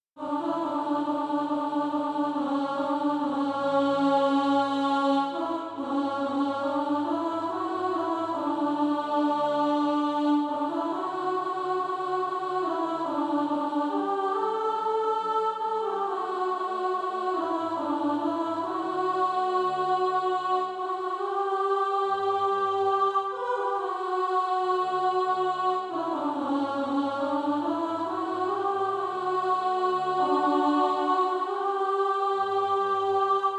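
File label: alto, Practise Music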